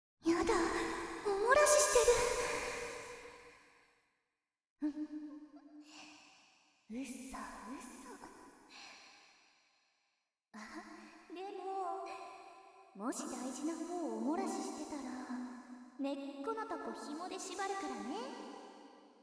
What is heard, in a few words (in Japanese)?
W耳舐めフェラ; SE無し; 夢囁き版; いたずらっ子なJKサキュバスの8分身で甘～い夢囁きトランス搾精; 脳イキ+トランス